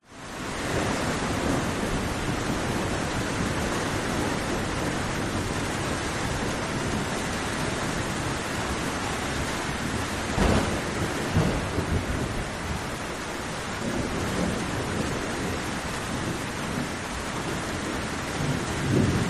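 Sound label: nature thunderstorm mostly distant thunder some closer light medium rain; zapsplat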